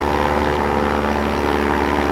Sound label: flying loop; Cookie Run; Pilot